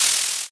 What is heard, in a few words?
cloth rip